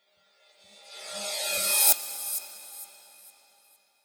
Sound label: FX Uplifter